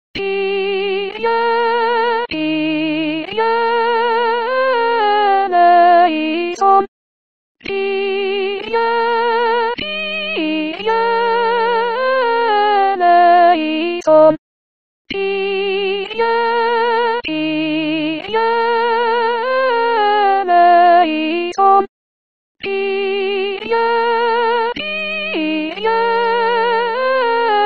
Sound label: Kyrie Soprano; Missa pro pace